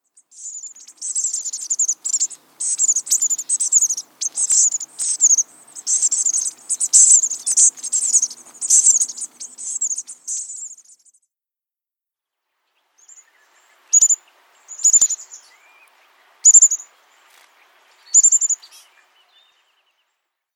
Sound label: Bushtit